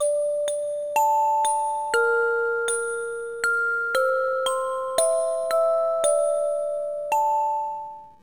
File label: background music box; Left in the Dark No One on Board